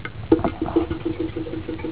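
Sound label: water draining, bathroom; EFFECTS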